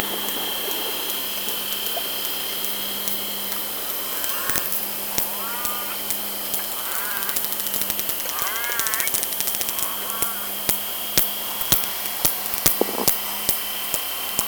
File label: Clicks Calls